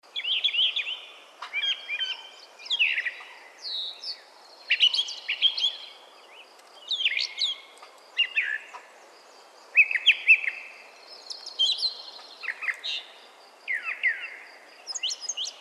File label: brown thrasher; calls; Audubon